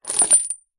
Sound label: industrial chain medium sized pick up from wood